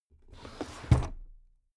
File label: drawer cabinet stuff close heavy